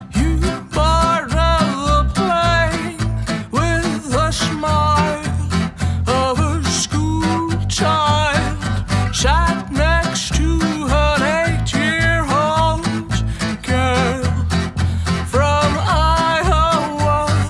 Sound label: Ringtone